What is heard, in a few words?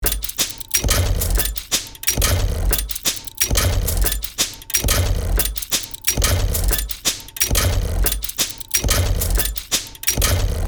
Steampunk machinery automated loop